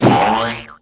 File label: Wacky - Big Boing; Scratch